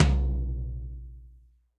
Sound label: Tom Floor Old School; Drums; Drum Hits; Ableton; Factory Packs; Session Drums Studio; MPE Hits